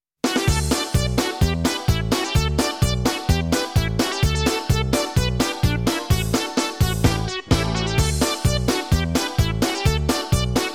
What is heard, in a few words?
Comical Slapstick; galaxy fraulein yuna music wave 3